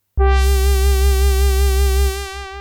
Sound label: Synth, Nasal Duckz